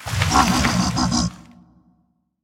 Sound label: howling tree; sfx